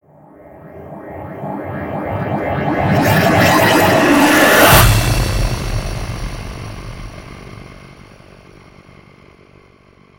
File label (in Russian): effekt narastaniya; zvyozdnie vrata